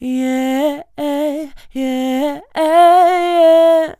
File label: Yeah ah ah, yeah ah ayyah - C Major, Chants; Vocals